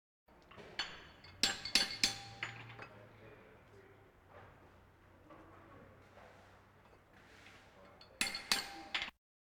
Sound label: Animal effects snorts grunts farm ambience